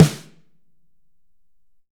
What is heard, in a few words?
7X14, Drums; Big Fish Audio; Ross Garfield Drums VOL-2; ST LOUIS KIT